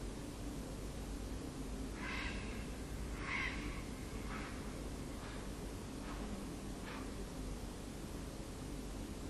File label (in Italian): probabili scocchi di freccia con passi